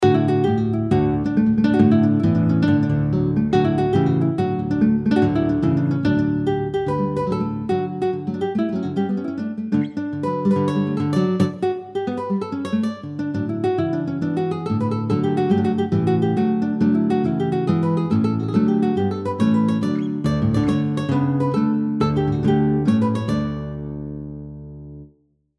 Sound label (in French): la gavotte de Paris